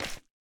resin hit; block; minecraft

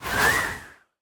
idle air, breeze; minecraft; mob

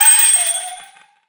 idle with item, allay; mob; minecraft

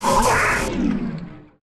elder hit; minecraft; plaguewhale

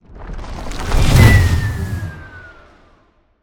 sprout respawn; player

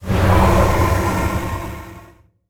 raid omen, mob effects; minecraft; event

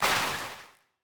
slide, breeze; mob; minecraft

